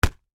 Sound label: Sparring Glove, Impact, Hit, Punch; Sports; Boxing; BlastWave